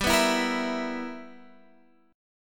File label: B Major 7th Suspended 4th Sharp 5th G